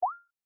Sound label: pop